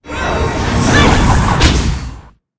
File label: dark mage attack